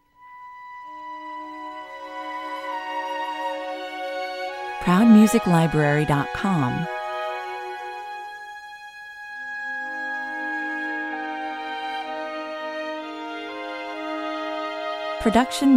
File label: prairie town morning 127 bpm, Cinematic Western orchestral music, grandiose and full of the pioneering spirit; with an authentic vintage old-west sound and feel. Performed live